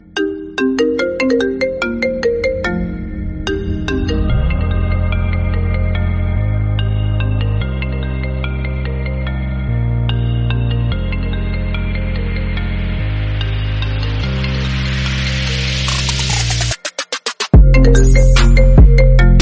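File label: iphone ring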